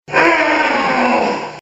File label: ahhh